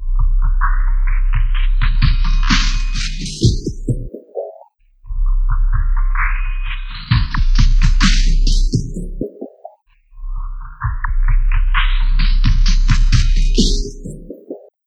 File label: FILTERS, Dark Side Of TripHop